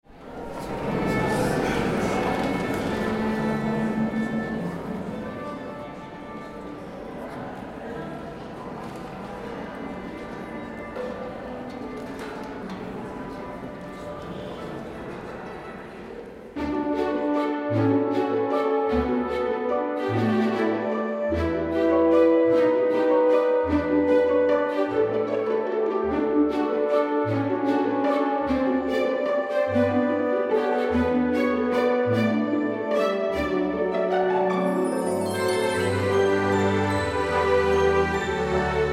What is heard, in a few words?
Waltz; ReelMusicianPro